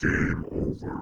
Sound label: Game Over